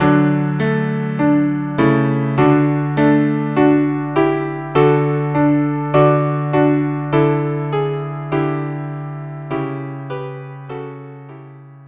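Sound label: violin viola cello; ave verum corpus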